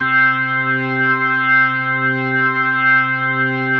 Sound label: C3S, B3